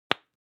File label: object hygiene lipstick open plop